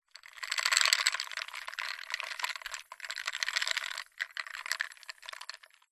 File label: food drink soda cola in glass ice slight shakes fizz; zapsplat